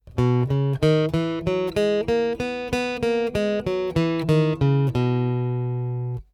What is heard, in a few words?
B Major Scale, guitar